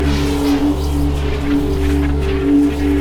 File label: summonerspell base barrier; LoL